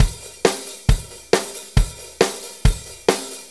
4-4 Loop, Drum Loops, Full Kit Loops; Drum Tools

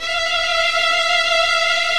VIO MIN TRIL

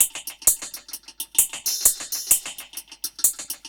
Dub Drum Kit C Wet Echo Hats 130bpm; musicradar; Dub Drums

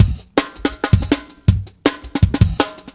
breaks 162

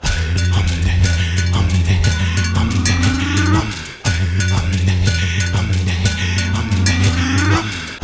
Tribal Vocal

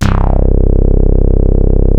DIGI MOOG; CYPERPUNK; Rubber Chicken Software Sample Bank

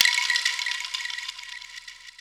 JD800 Internal Drums, Roland JD800

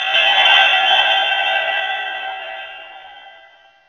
LOWOWO BELLS; ENVIRN-AMB

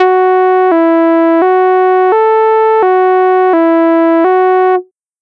Synth, Trip-Hop Loops; Sonic Foundry (Sony Creative Software); Rondo Brothers

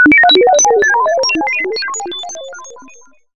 sci fi; Masterbits - Switch FX

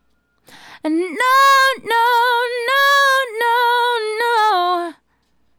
Female Solo, Hip-Hop and RnB Vocals

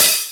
HH, Dance Mega Drums; Best Service; HH HIP

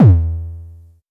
High Kicks E2; LIMAT Div Dept; Drums

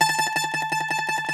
Greek Bazouki, Plucked Strings

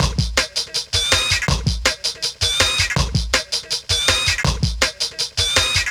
162, breaks; m8 breaks

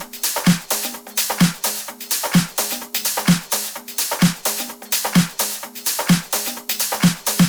Loops 128BPM, Drumloops

